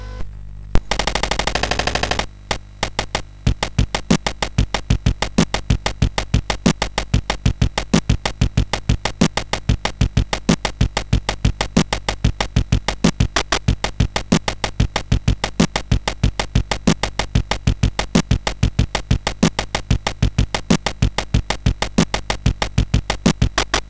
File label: Arkanoid Drums, CSG8580R5